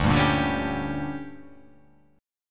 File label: D Major 7th Suspended 4th Sharp 5th